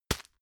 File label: tissue grab hard; objekte; taschentuch